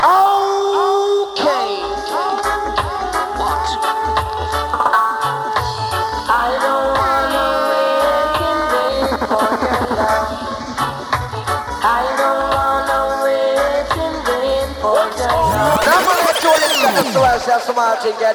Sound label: Ragga Metal